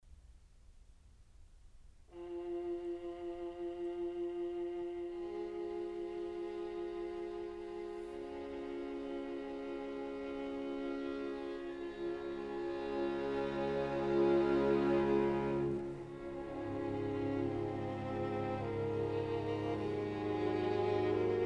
String Quartet in F major

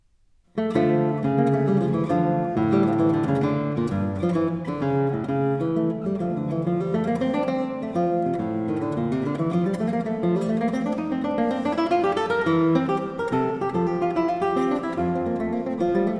Violin Partita No 2 in D Minor, guitar, Corrente; Bach Transcriptions and Arrangements for Guitar

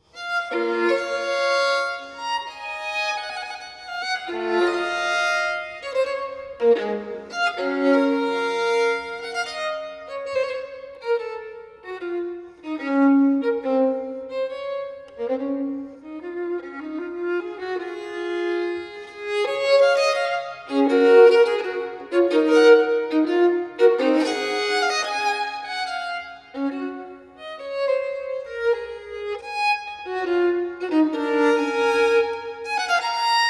Partita in B minor, Violin Solo; Partita nr.1; BWV 1002; Bach; Sonatas & Partitas for Violin Solo

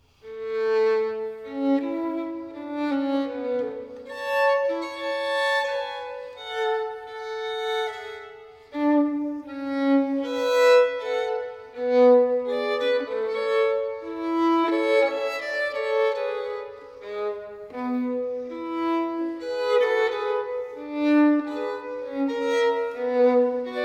Sonate nr.1 in G minor BWV 1001, Violin Solo; Bach; Sonatas & Partitas for Violin Solo

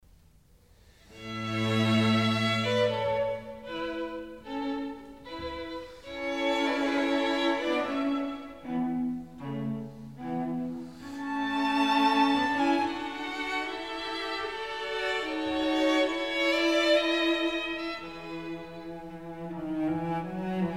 Quartet in E, String Quartet; Haydn; Op. 54

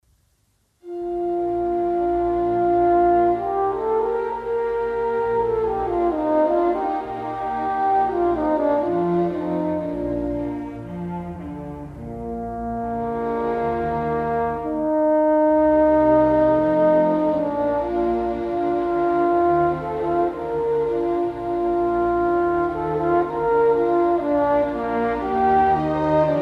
Horn Concerto No. 4 in E flat; Mozart Horn Concertos

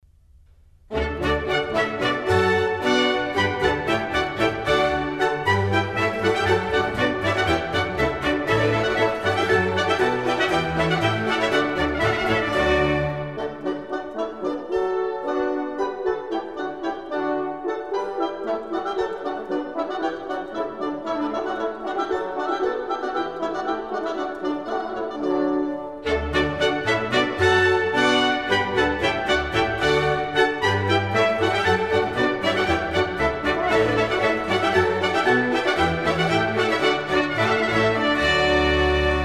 Water Music Suite

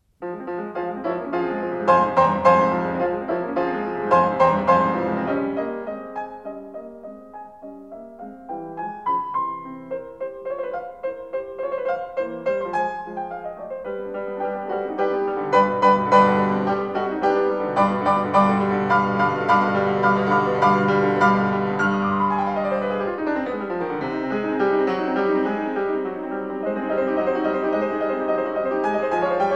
Piano Sonata No. 1 in F minor; Wilhelm Kempff; Beethoven; Piano Sonatas

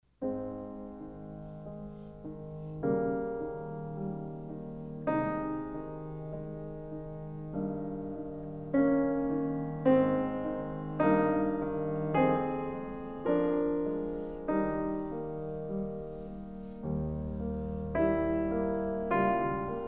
Piano Sonata in C minor

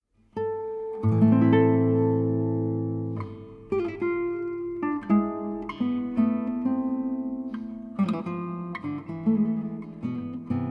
Guitar Suite in A Minor, Allemanda; Johann Sebastian Bach

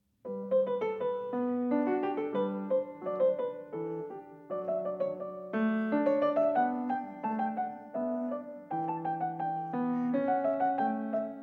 Goldberg Variations BWV 988 Variation; Bach

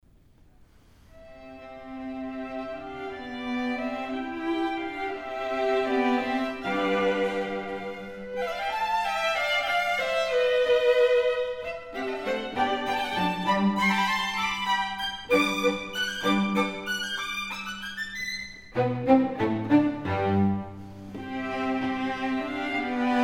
Menuetto, String Quartet; Haydn

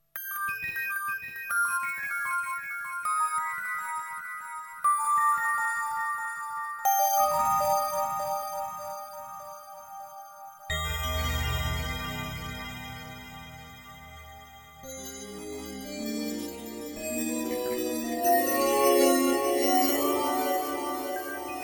Bell Threshold; Exeter

